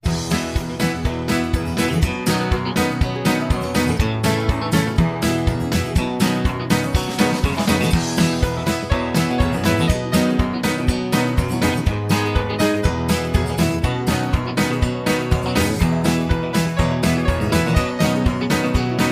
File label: Instrumental